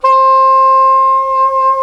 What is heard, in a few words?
SAX SOP MF, Soprano Long